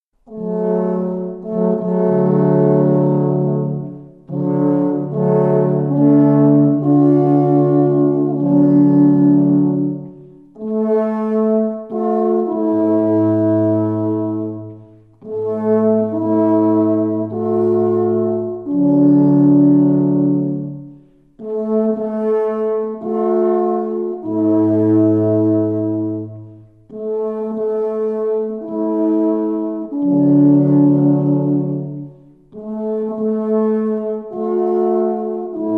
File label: Alphorn